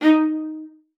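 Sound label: D#4, viola spiccato; Xsample VOL-5; 90 s Sample CDs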